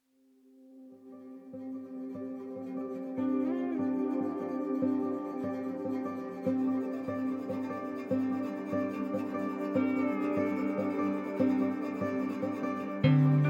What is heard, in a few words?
Bpm73; Action/ Adventure